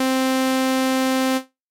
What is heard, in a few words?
Basic saw; 在Massive X中; 加载一个基础的锯齿波音色